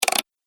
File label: Pc Mouse Wheel; High Tech Sound Effects